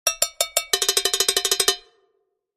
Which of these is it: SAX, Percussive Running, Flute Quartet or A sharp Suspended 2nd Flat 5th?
Percussive Running